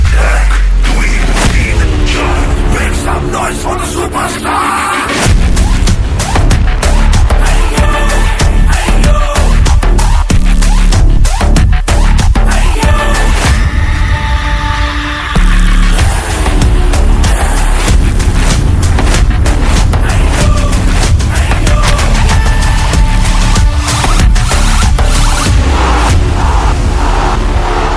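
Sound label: background music RINGTONE, PUNJABI; KHADAAN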